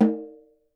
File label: SN, Percussion